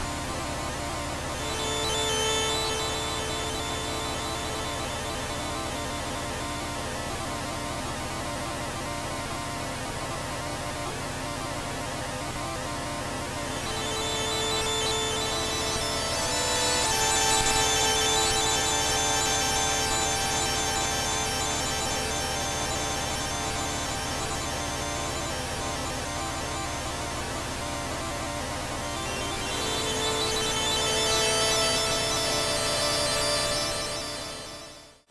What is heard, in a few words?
F drone